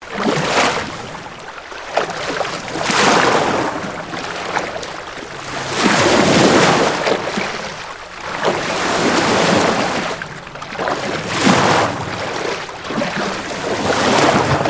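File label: Water Splash Movement Loop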